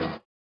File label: SWUSH; Spectrasonics; Metamorphosis